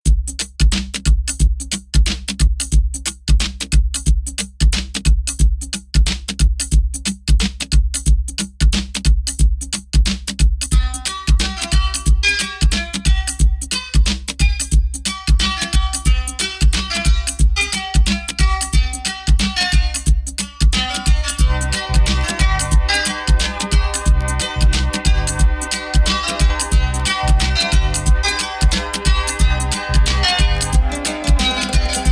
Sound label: sensual rhythm arabic flow 432 hz, Brzmienia arabskie i hinduskie